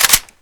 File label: veresk bolt full; weapons; swb